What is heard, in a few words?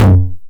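909 low tom, tape saturation; Toms; Goldbaby Tape808 & Tape909; The Tape 909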